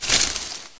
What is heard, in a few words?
bush